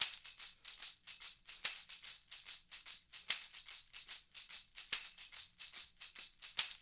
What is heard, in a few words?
LOOP, SHAKERS; Percussion; InVision Interactive; Percussion Slam